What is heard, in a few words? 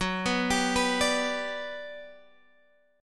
G Major F sharp